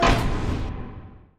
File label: UI Counter; Monster Jam Steel Titans